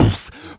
Beatbox Snare, Human Beatbox; Vengeance Samples; Special Sounds

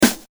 Snare, trap

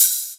Open Hihat; Vengeance Samples; VEE Cymbals

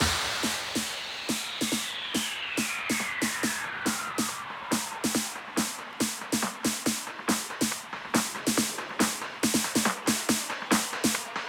140BPM Two Clap 32Bars, HandsUp & Trance Fills; Vengeance Samples

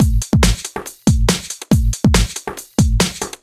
Loops BB 140, BreakBeats; Vengeance Samples

